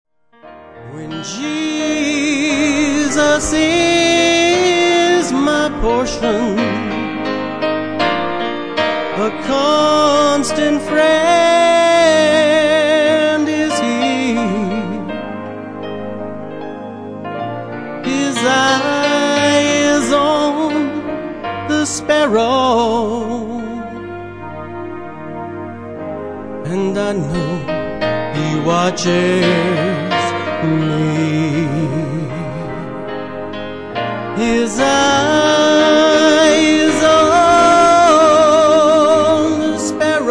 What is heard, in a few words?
sparrow